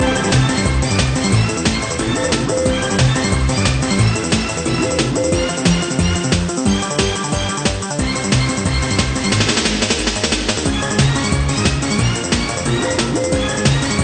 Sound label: salsa